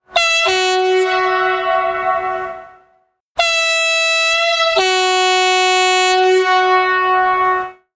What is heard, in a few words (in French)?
klaxon 2 trompes noir; veritable tgv; klaxons